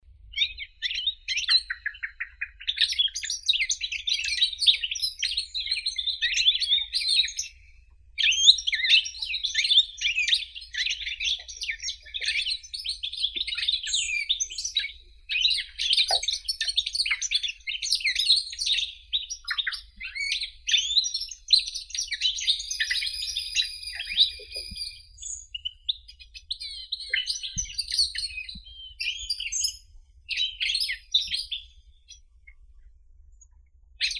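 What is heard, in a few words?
goldfinch, Carduelis carduelis; shegol; zvuki prirodi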